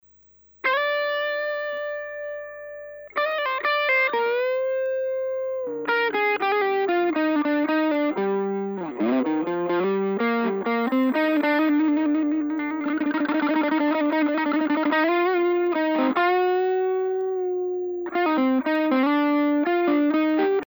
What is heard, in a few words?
Guitar SM57